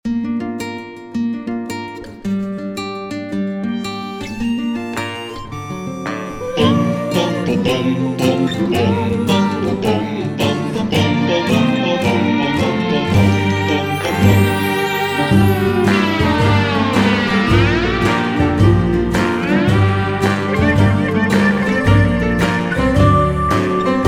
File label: Ring tone; O2